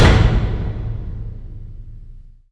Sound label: PVP Flag Captured Mono; Horde; Interface